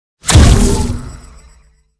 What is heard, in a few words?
deadlock barrier active